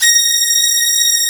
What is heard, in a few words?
A#4 VIOLA; AKAI S6000 CD-ROM - Volume 1; Strings